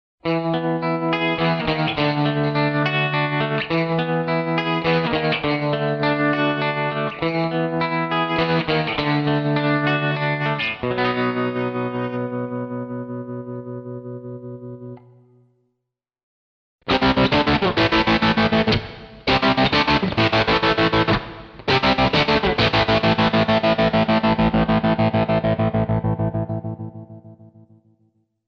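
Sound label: Tremolo TR-2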